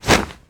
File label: sword; buckler